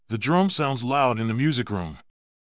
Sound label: The drum sounds loud in the music room